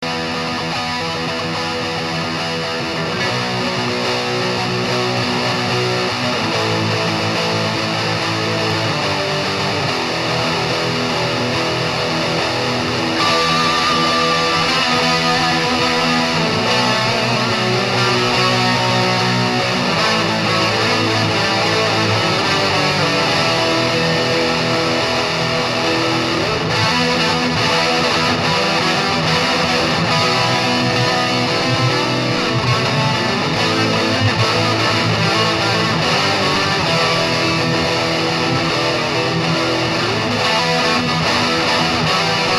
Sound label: Riff